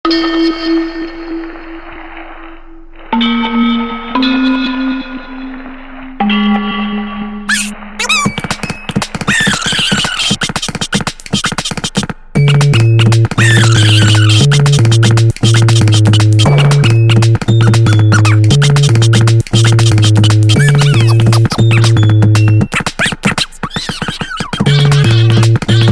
beat

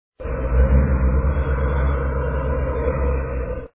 gasp

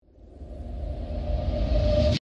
parried

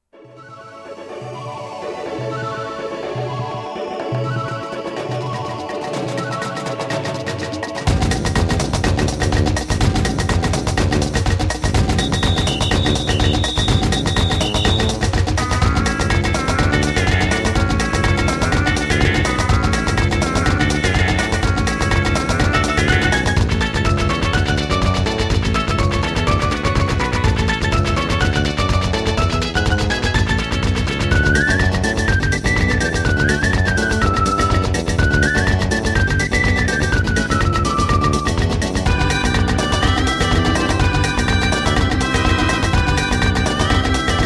congrats music

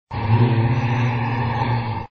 gasp

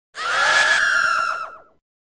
scream

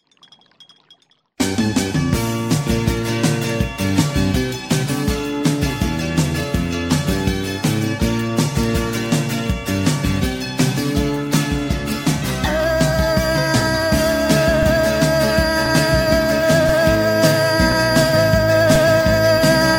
ooohhhhh

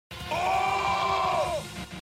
OOOOOH

